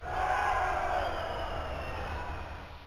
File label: cheer